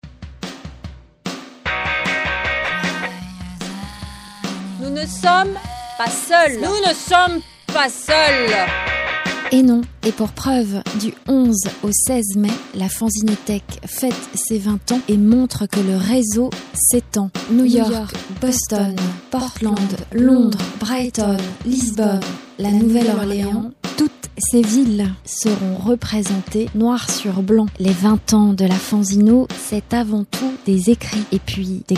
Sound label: jingle; styl fm